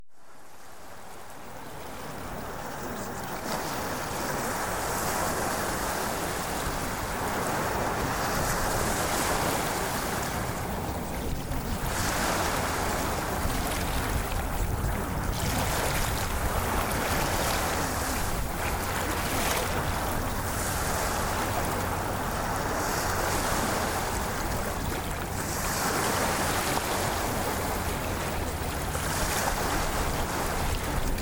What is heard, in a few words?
Ocean Waves